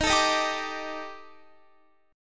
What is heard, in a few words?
Eb Major 7th Flat 5th Eb Guitar 4E 4A 5D 5G 5C 6F; EbM7b5; strummed